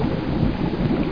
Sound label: lava; dosgames; roc